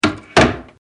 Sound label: Door Wooden Close